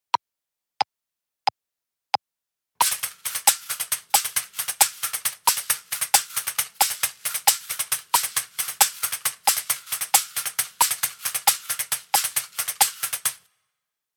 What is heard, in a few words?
Caxixi